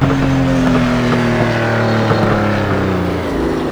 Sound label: slowdown slow, vehicles cars; lykan hypersport; lwcars